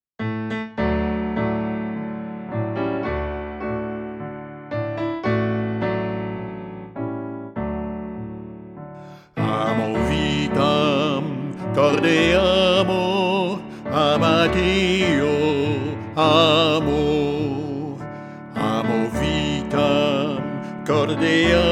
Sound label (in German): Gesang Klavier